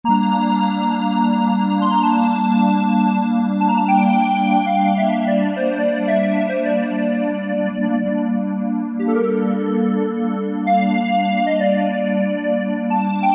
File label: Alesis Z5 Vintage Synthesizers; Classic Beatboxes